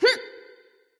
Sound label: grunt jump; smite; nemesis